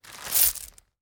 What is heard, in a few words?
Getting Gold; UI